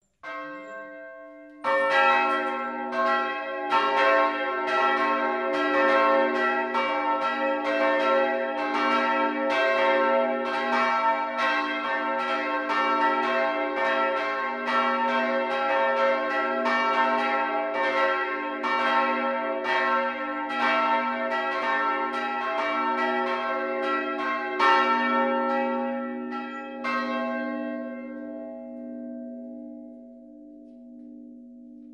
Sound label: bells ringing chimes